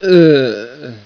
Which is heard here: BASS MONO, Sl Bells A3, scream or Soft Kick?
scream